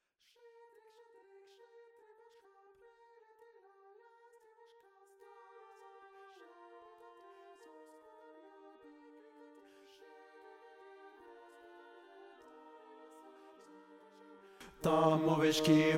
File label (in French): basse; shchedryk